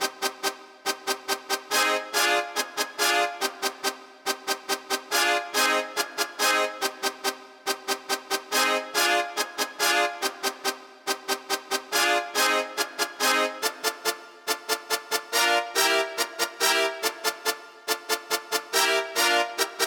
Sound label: epic synth brass melody C major